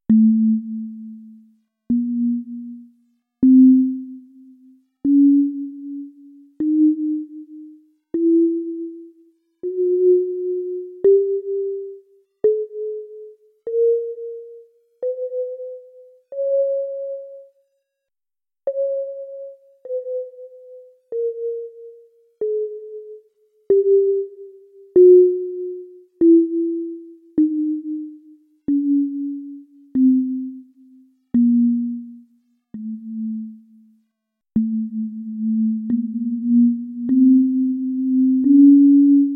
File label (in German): euler rein skala; MGNM Musikfest2015